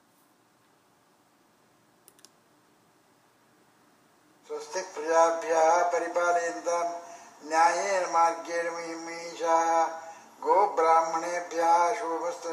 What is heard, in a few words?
Guruji Chants Closing Mantra